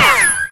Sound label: laser hit; darwinia